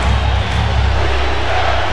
Football Crowd Defense Loop; Crowds

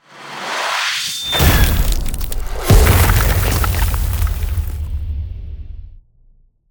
Ice Spell Damage AoE Frozen Shockwave; Arctic Aura

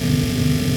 Machine Generator Loop; Machinery - Generators

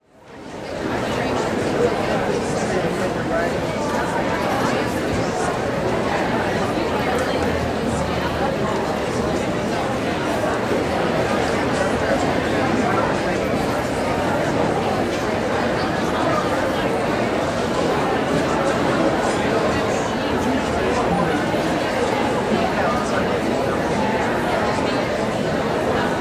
Crowd Indoor Medium Crowded Room; Walla; Sound Ideas; Walla Series

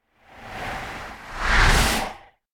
Whoosh By Classic Thin And Fast Pulsate Whoosh; Hollywood Edge; sound designer's toolkit v2

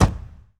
Ferrari 355 Door Close; Automobiles

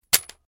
Shotgun - Reload - Closed; RadwinIsland

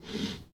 Foosball Forward Slide

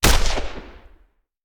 Machine Gun; Sound Ideas; Guns

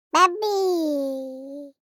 BABIES TALK Boy 12 months Babeeeeehhhh; Baby Boom

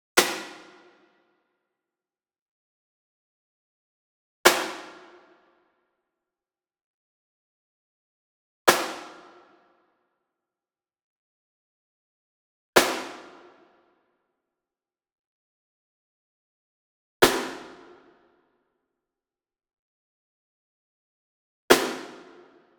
SINGLE SNARE Pearl Marching Hit Rim Medium; boom library; Cinematic Strikes Construction Kit

